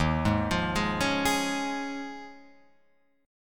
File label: Eb 7th Suspended 2nd Suspended 4th Eb down Guitar Open F, Eb7sus2sus4 chord; arpeggiated; JGuitar